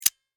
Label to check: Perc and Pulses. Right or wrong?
Wrong